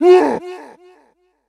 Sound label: Bandit Leader Death; Kilta